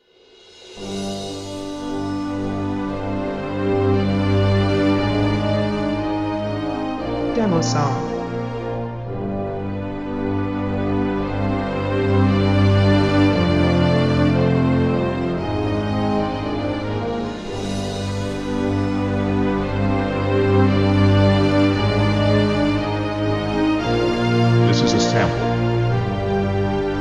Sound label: Peaceful Thousand Oaks Forest